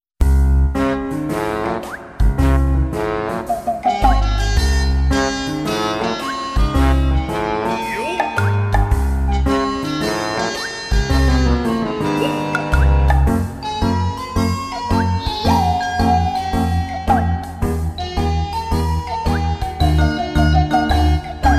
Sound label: funny march; berserk chapter of the millenium falcon record of the hol